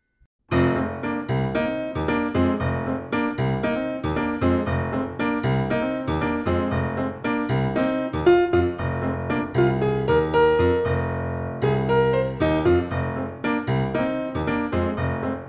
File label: Jazz Piano Cover; The 5 Most Catchy Jazz Tunes